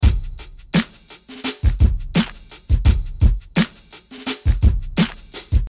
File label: beat